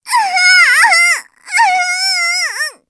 fail sound

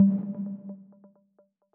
pause retry hover; 『Chip 1.1』

